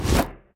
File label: searching for player